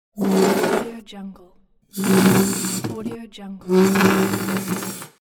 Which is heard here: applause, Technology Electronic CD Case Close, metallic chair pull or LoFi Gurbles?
metallic chair pull